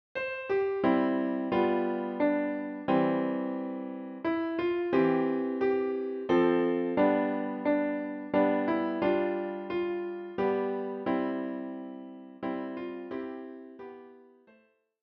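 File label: piano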